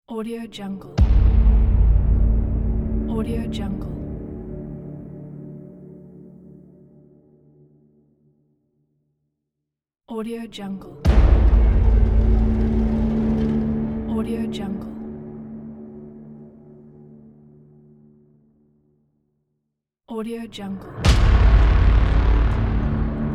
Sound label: horror boom hit